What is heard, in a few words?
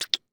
Human Male Suck On Finger